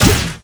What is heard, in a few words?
Bounce Ball Hit; gamerip